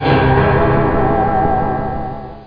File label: wacky spooky; Widget Workshop; Maxis